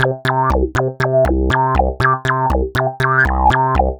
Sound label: Stack Bass; Sonic Foundry (Sony Creative Software); The Electronic Music Manuscript Collection; Bass Foundations